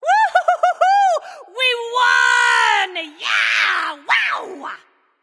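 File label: yay, female; smite; voicepack; hera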